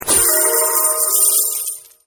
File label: win